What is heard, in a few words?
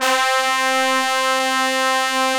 BRASS, Brass Bones, Brs Layer; Club-50 - Foundations Roland